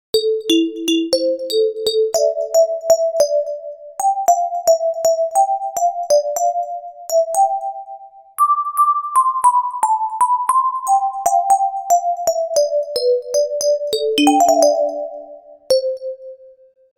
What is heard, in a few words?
DX7 Vibes